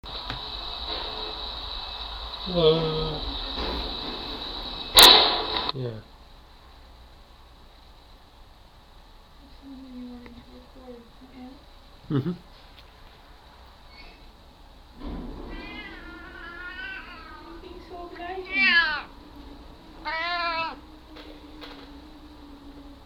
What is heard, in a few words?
meow; Cleopatra